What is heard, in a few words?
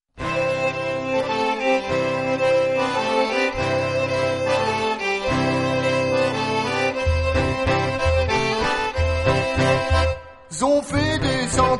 blues